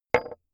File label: metal impact wood light